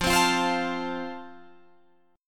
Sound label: F Major, Guitar Drop D